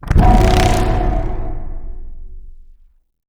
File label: amored activate